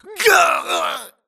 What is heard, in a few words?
grunt electro; Titanfall 2